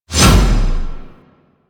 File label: Proj Throw; Army; Warframe; Hoplite